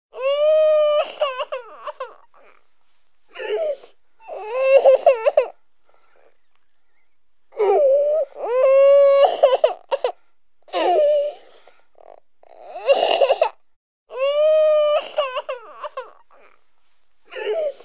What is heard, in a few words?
Child Crying Toddler Crys Whinging Screaming Baby; Child Crying Sound Effect